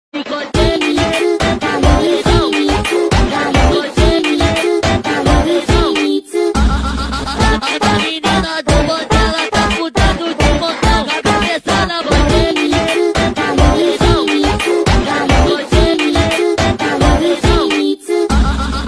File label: funk song